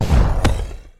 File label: yeti die; Clicker Heroes